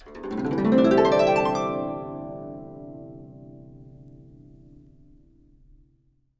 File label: GUCH GLS, GUCHIN; INIS - Opium; GUCHIN-GLS